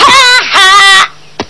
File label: haha